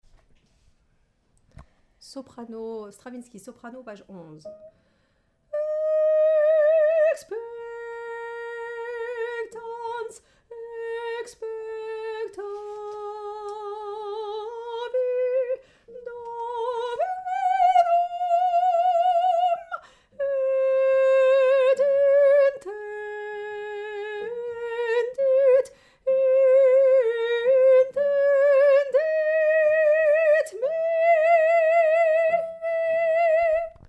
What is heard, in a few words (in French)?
psaume Soprano